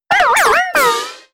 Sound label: Defeat; iOS, Mobile, Switch